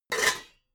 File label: pots clank